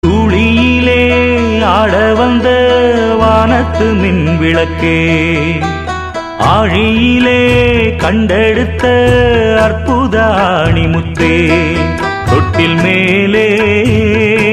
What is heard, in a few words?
tamil ring ringtone